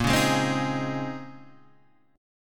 A sharp Minor 11th A sharp down Guitar Half Step Down, chord